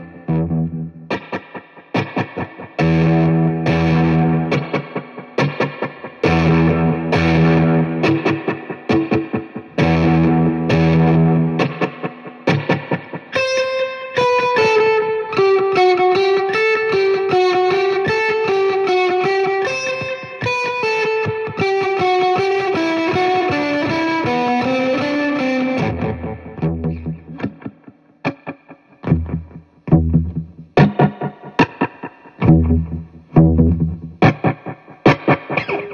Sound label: riff; micro bassman low pony